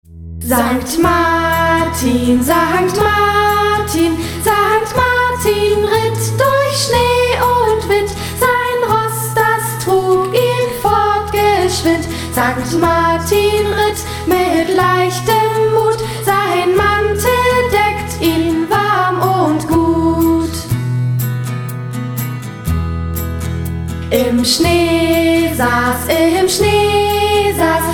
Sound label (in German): Sankt Martin ritt durch Schnee und Wind